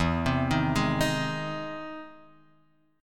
E Augmented Major 7th E, E+M7 chord; arpeggiated; JGuitar

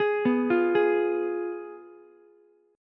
F Diminished Ukulele; Fdim arpeggiated; JGuitar

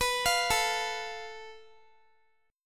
A Suspended 2nd Sharp 5th, Guitar; Asus2#5; arpeggiated; x,x,21,22,21,x

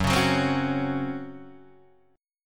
Db Major 7th Suspended 4th Gb

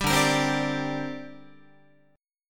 D 7th Suspended 4th Sharp 5th down Guitar; D7sus4#5; strummed; 10,10,13,10,11,13

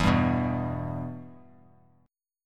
E-Minor; strummed; JGuitar

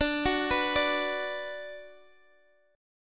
Gb Suspended 4th Sharp 5th D, guitar

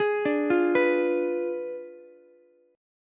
B Diminished 7th B down Ukulele; arpeggiated; JGuitar

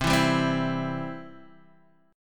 C Major, Guitar, DADGAD; down; chord shape

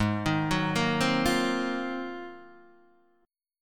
Ab 13th Guitar DADGAD, Ab13 chord; arpeggiated; JGuitar

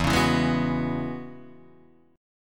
E 6th Add 9th E down Guitar Open D

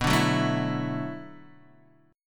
B Minor 11th B down Guitar Open D

